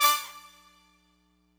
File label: horn stab, Emin; Big Fish Audio - Hardcore Breakbeat Action; Hardcore Breakbeat Action CD1; 07 Testify 150 Emin